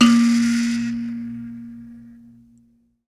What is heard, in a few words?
BUZZ, Buzz Kalimba; Roland LCDP11 Africa VOL-1